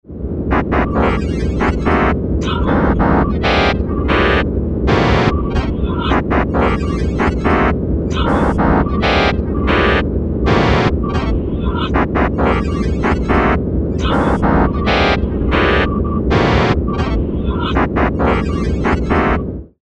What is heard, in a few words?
cacophany computer